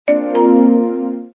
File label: new sms conv